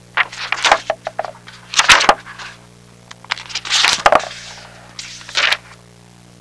TURNING, book

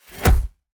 knife throw

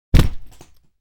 suitcase drop

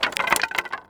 wood debris drop

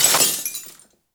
glass vase break

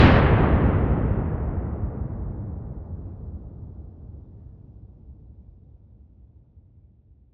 dynamite distant explosion

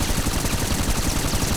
Minigun Fire

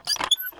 cogs squeak

